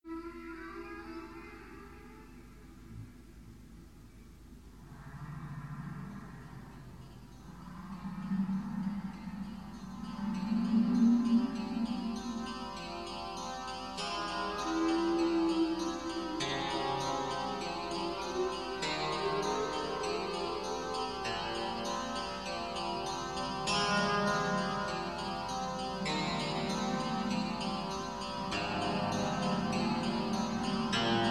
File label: More Noise Than Melody